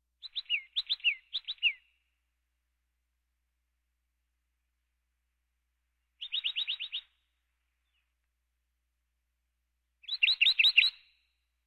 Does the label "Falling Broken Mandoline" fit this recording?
No